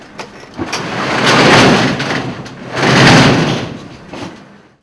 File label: door rolling up